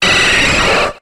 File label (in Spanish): Grito